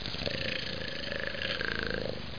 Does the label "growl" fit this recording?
Yes